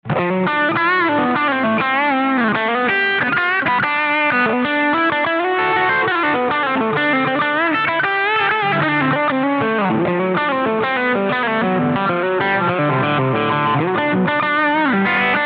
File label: GT-10 Country Lead